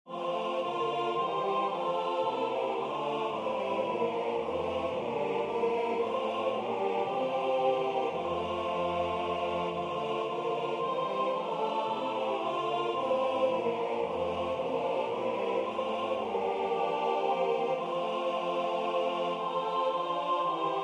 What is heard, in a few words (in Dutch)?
Het lied van de dag des Heren SATB